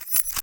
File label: unlock; calamity cobra 2